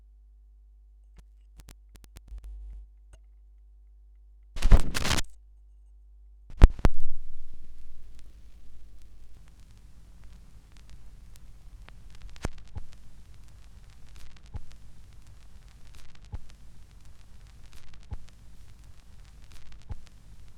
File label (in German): schallplattengeraeusche